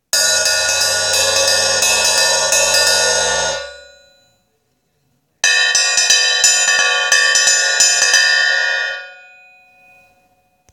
Taye 14 top

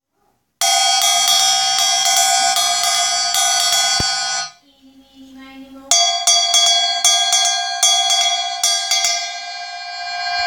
mini10 bottom, drums

